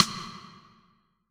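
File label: STICK, drum machines; JV-1080 DRMS; EdgeSounds; Drum Mashines VOL-1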